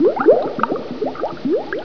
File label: Bubbling Brew